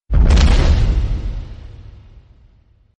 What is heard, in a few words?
godzilla stomp